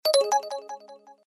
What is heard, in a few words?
send success; Coolpad S60